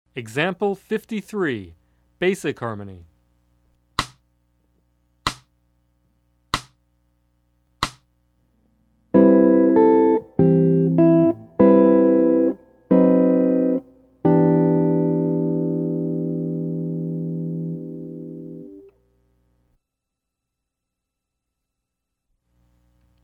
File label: Jazz